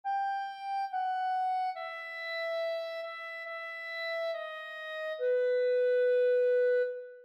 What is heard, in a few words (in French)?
CLARINETTE